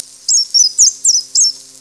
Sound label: ROBIN; ANIMALS